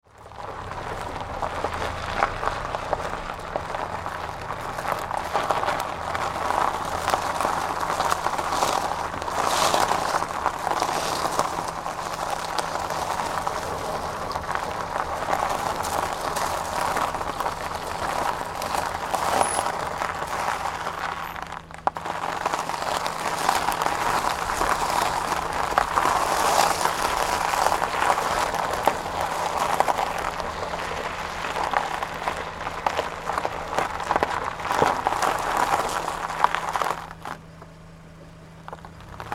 Car Tyres on Gravel, Slowly driving around on gravel - stops and starts; some background engine; Cars; Tom Hutchings